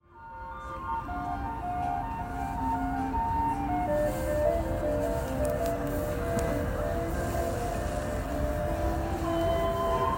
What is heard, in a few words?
Natchez Calliope